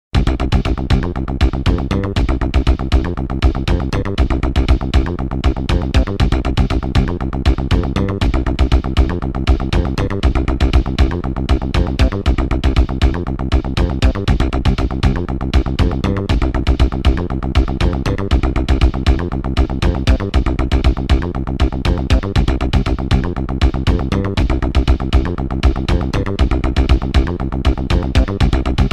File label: Bass Snare Pulse